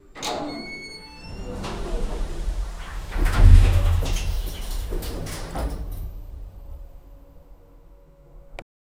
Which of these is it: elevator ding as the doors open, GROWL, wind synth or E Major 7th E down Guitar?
elevator ding as the doors open